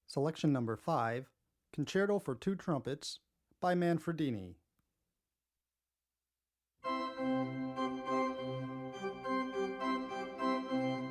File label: Concerto for 2 Trumpets; Manfredini; philipfeo